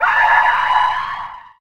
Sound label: Fear; Puzzle Chronicles; PS3; PSP; X360; SFX SPELLS